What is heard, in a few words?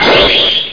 Kick Out From Merlin; Crystal Caliburn